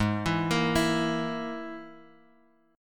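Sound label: Ab Suspended 2nd Sharp 5th chord, Guitar, Open F tuning; arpeggiated; 8,11,10,11,x,x; JGuitar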